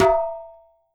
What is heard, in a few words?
WORLD DRUMS MIXED DRUMS; World Instruments 2; PS MIXED DRUMS